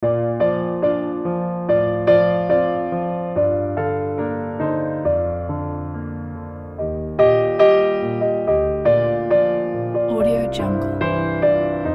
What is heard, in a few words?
jingle bells piano solo